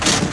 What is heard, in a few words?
Slider